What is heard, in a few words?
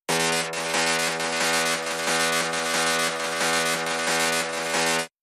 Metal